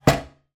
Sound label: household bathroom scales place down on bathroom floor; zapsplat